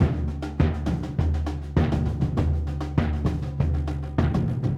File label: Surdo; Ambient Grooves; World Groove